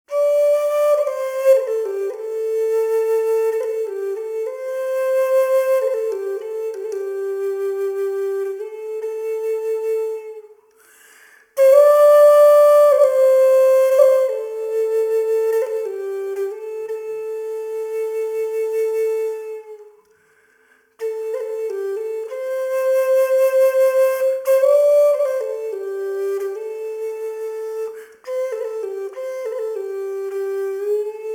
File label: Spirit Flute